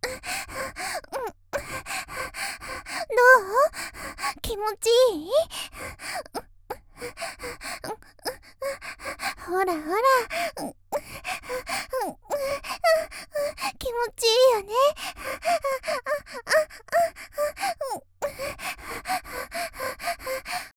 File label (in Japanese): 元気な少女, 「手コキ声」（気持ちいい？）と言いながら激しい喘ぎ